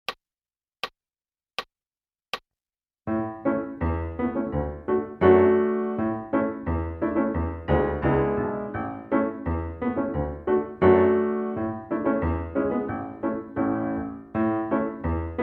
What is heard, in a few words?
Piano; Boil 'em Cabbage Down